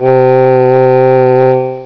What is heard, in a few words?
VOCAL